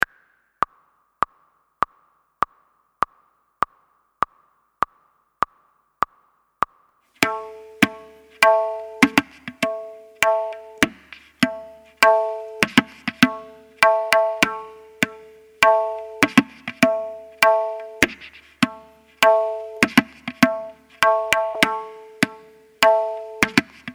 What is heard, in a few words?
Ek tal Practice